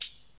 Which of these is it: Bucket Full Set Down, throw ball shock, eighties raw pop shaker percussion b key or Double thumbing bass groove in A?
eighties raw pop shaker percussion b key